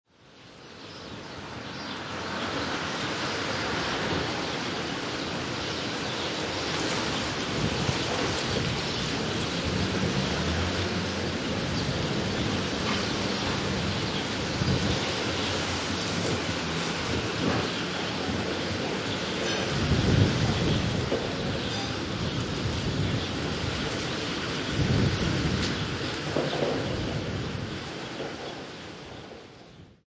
Windy Rural Ambience, Wind blowing through trees along a quiet country ro; Birds singing; Very distant sound of motorbike driving; Lynne Music